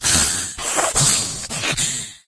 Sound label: rage at victim, infected female; Left 4 Dead 2; Source Engine